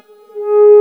G#2 METAL SY, XIANG REEDS; NorthStar - The Wizard VOL-2

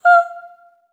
STACC F5

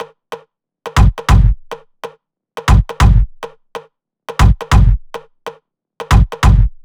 140 Prog Trance Beat; Phalanx; Vengeance - Essential Clubsounds Vol.5; VEC5 Drumloops; VEC5 Multi Loops 140BPM

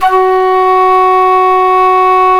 FLT FL AC, C Flutes; Roland LCDP04 Orchestral Winds; 90 s Sample CDs; C Flutes 3-8

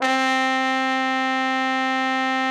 TROMBONE, SECTION LOOP; Best Service; Killer Horns

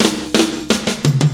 Monsterfoot fill, Drums Beats

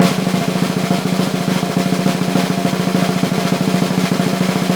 SNR, Synclavier Percussion 2 - World & Orchestral

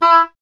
E. Horn

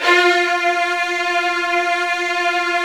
Virtuoso Strings

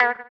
VIBRA WAH, guitar; Spectrasonics; Bizarre Guitar

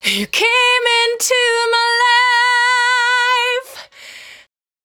Cry 68bpm B, Vocal; Zero-G

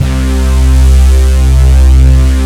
BRAS, Moog Synths; ORSON; E-MU Formula 4000 Series Vol. 3 – Analog Odyssey

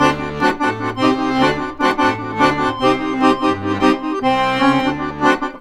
ACC, Balkanish Traditions

